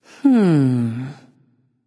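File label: thinking, female; smite; voicepack; hera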